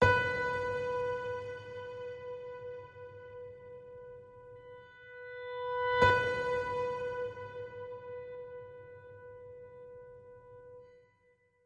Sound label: Piano Note B